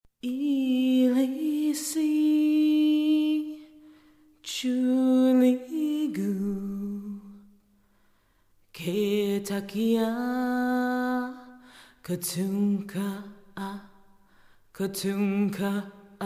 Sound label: Lullaby